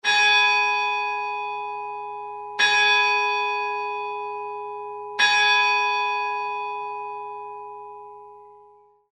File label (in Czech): zvon; zvonohra